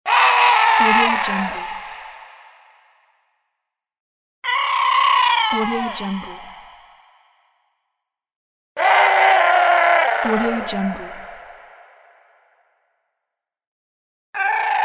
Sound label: angry scream